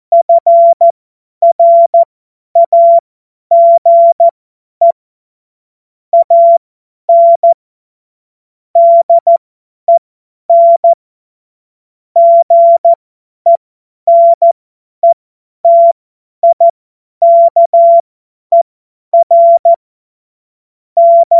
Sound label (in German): Witz, Morsekurs, cw morsen; Tempo 50 F70; hamradio; cw witze